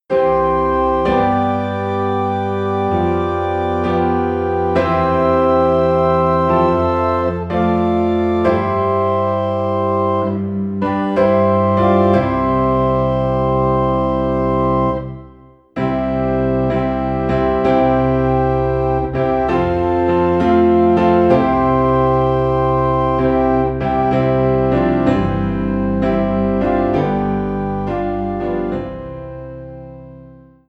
Piano and Organ in C; O Holy Night; christmas

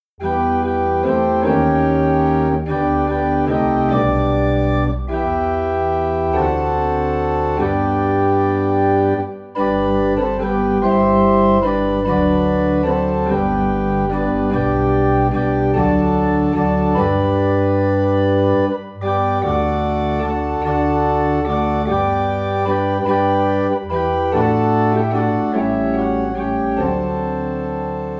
Organ in G; Jesus Is All the World to Me

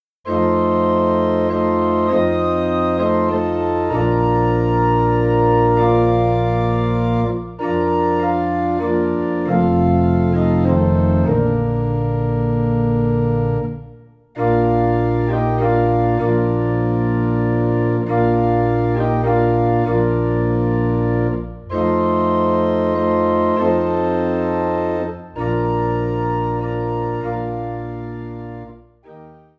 Organ in B Flat; christmas; Silent Night

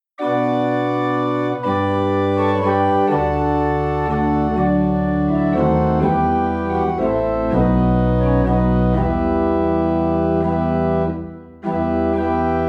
Organ in E Minor; christmas; What Child Is This

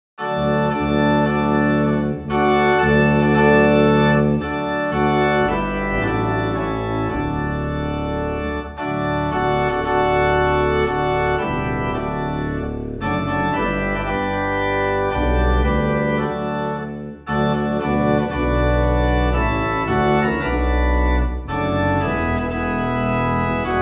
Organ Low in D; Victory In Jesus

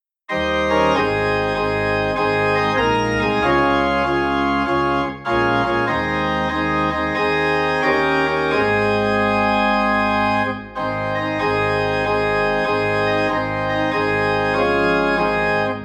Organ in A Flat; Are You Washed In the Blood

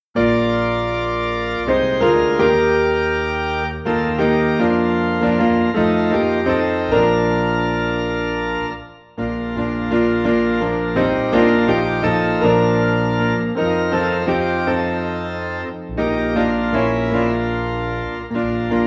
Piano and Organ in B Flat; Jesus Is Calling

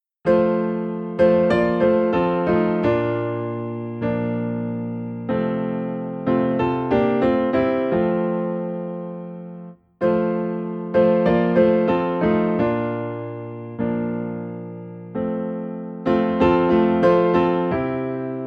Piano in F

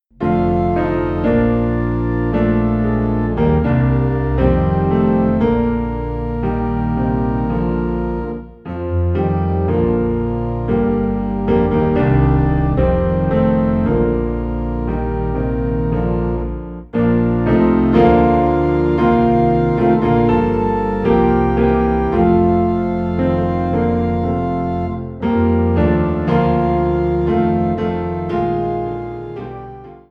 Piano and Organ Low in B Flat; Let Us Break Bread Together

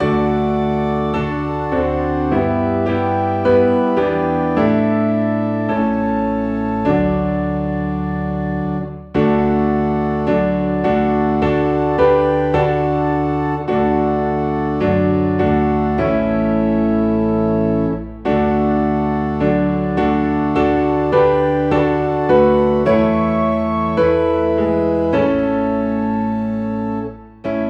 Piano and Organ in D

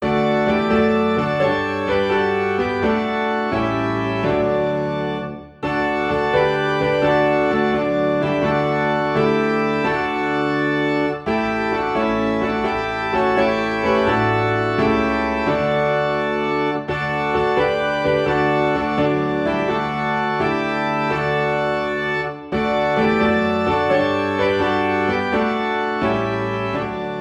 Piano and Organ in D; Anywhere With Jesus

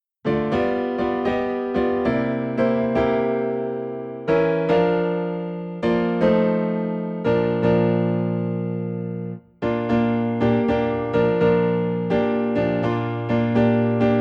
Piano in B Flat

